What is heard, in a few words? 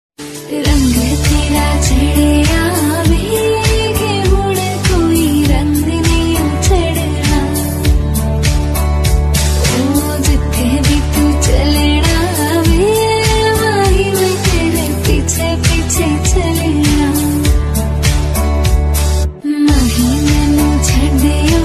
romantic bollywood song ringtone